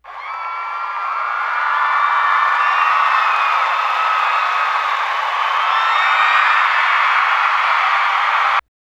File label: crowd, Hardcore Breakbeat; Big Fish Audio; Hardcore Breakbeat Action; Robot Live